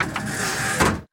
Jukebox Turn Page; sound-effects-bible

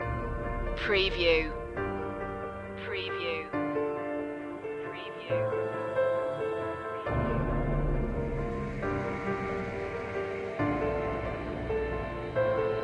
the fountain of life no choir relaxed

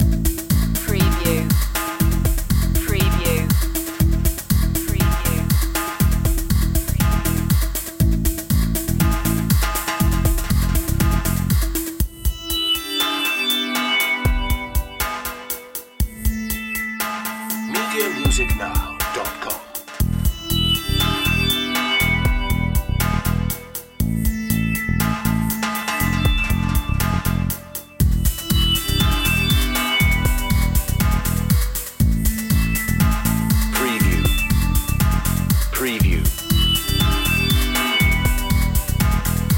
trend setter loop

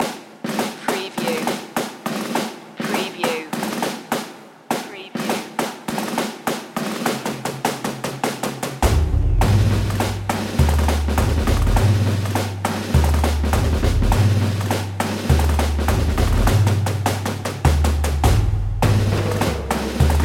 tattoo drumline

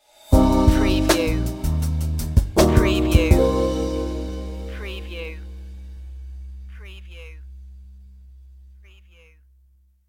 jazzy chill stinger

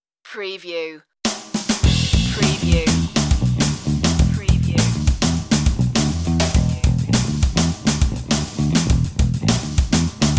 chat show bass drums

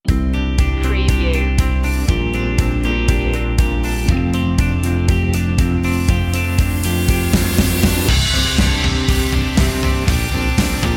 corporate uplifting

